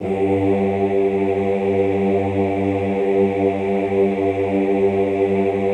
OOH G1, Classical Choir; Best Service; Extended Classical Choir

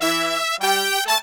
100, BRASS SAMBA

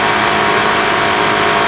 constant, Engines; E-MU Producer Series Vol. 3 – Hollywood Sound Effects; Ambient Sounds

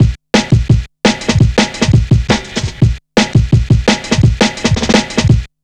170, Drum Loops, Drum & Bass

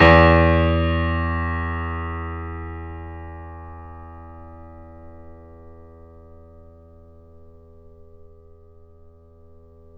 Mf E 2, Grand Piano off mic

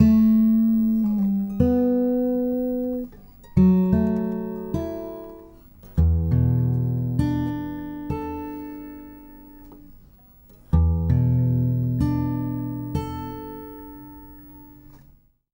Blue Trees Gtr, Guitar Only; Sonic Foundry (Sony Creative Software); Friendly Fire

